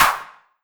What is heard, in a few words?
drum hit whistle; osu!